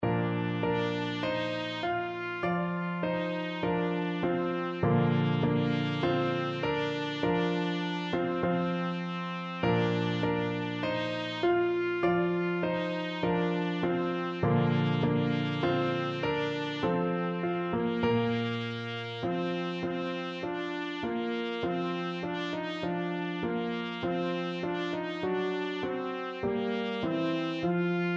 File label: beethoven ode to joy, trumpet